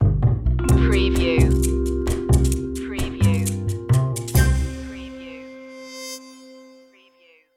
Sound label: jazzy opener